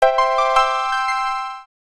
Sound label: celebration modern